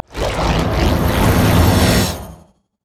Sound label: mariner bomb; LoL; fizz skin04